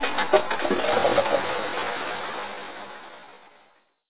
aeroplane start

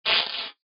tear

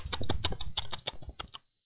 fly eat

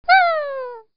yey

